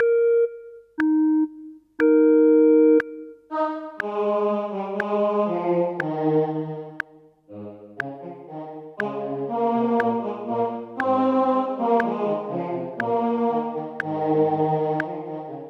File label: O clap your hands; Gibbons